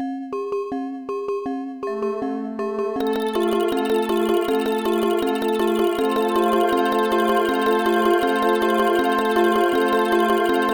drum, ORG; Deluxe Music Construction Set

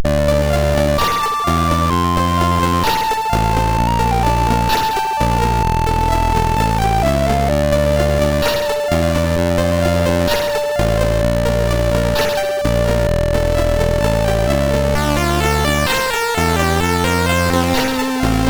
sid MOS6581R4; Tiger Mission

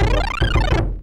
trucking; Sonix Music Driver

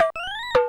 chip flip; Sonix Music Driver

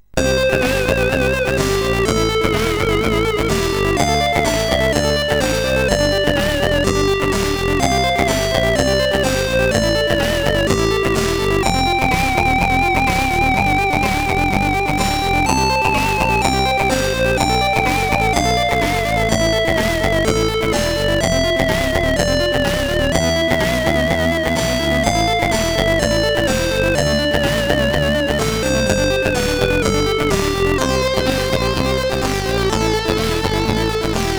Game Musix, CSG8580R5

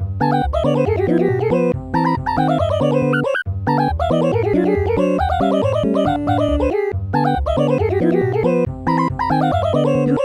chipmunk rag; Instant Music

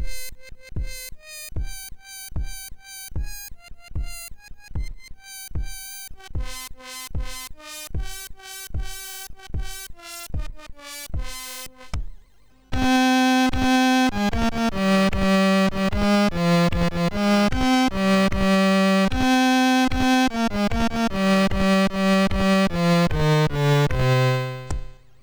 Wellerman Loop

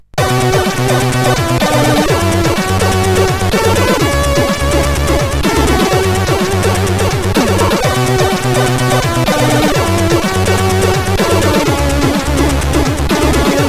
Remix, CSG8580R5

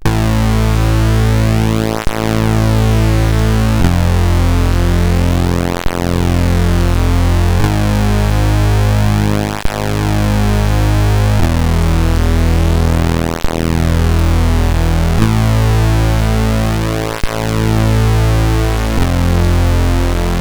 sid CSG8580R5

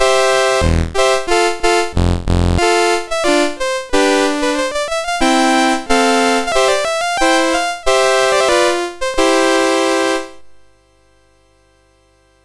sid MOS6581R4; Movie Monster Game

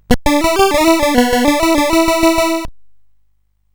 sid CSG8580R5; Congo Bongo version 2

